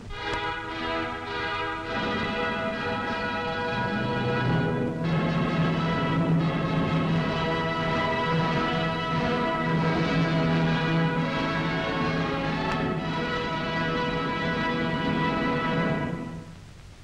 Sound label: ringtone